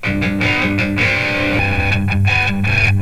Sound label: FILTER GTR, GUITARS KIT; Big Beat Samples & More; AKAI